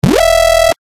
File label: bass shot E; Morpheus; revealed bass shots vol 3